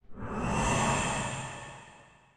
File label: nightmare glide; Smite; Thanatos